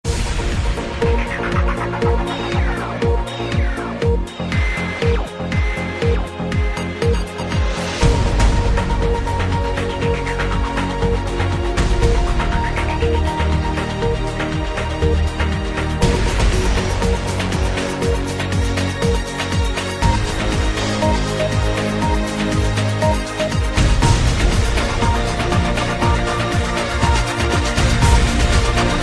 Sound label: Countdown Music; Bbc